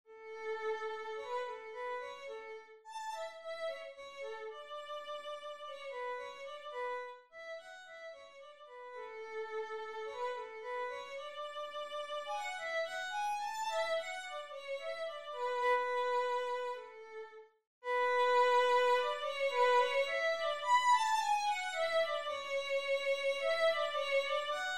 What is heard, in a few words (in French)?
sonatine; beethoven ludwig van